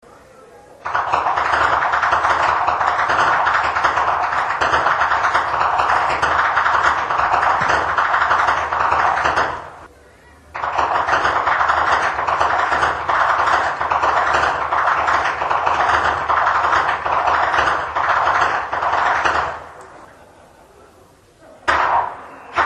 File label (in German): schnalzen, flachgau; liefering